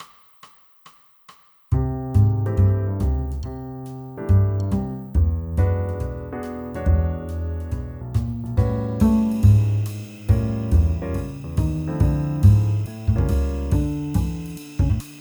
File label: bass; AI Jazz Bass Demo 2